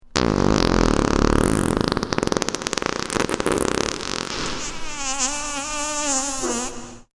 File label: fart bassa